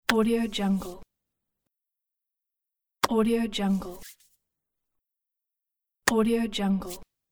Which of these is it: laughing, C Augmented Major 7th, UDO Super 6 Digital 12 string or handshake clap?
handshake clap